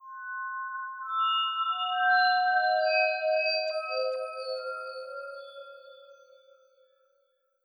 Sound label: Monster Altar; Monster Altar D1